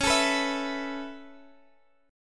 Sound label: Db Minor 7th Sharp 5th, Guitar, Standard; strummed; x,x,11,14,12,12